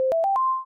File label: level up